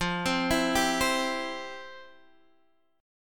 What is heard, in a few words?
F Major 7th Suspended 2nd, Guitar, Half Step Down; FM7sus2